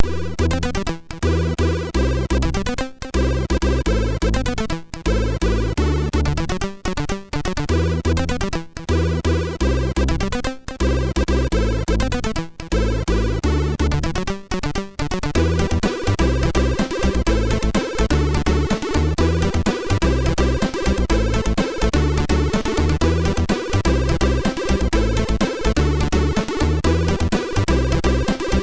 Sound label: MOS 6581 R2